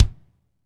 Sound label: kick, lite jazz, Roland; Northstar; Drumscapes Roland; AC Lite Jazz; Kicks